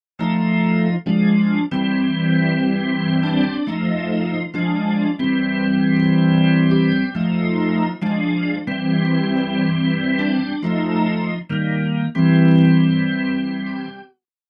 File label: Quasi Leslie Speaker Cab Organ Wet; Chorus; Vox Quantizer; syntheway